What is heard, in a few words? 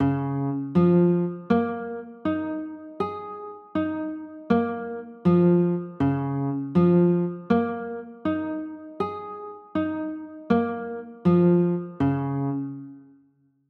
c sharp 6 9, chords